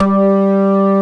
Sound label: ORG, Techno Trance; Orbit Presets